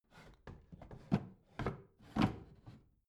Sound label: bathroom drawer close rattle